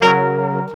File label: brs f#, brass; house; PS-28 Vocal House Samples